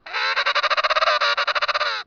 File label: Emperor Female, Penguin Sounds; Antarctica; Manchots